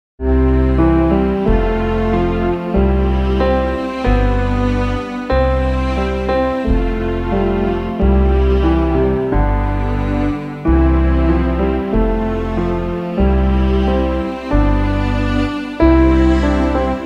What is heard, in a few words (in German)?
keyboard; ostern